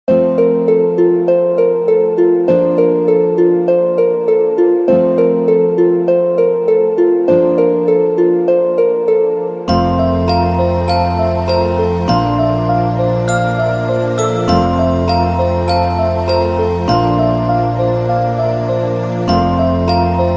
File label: backsound music gamelan modern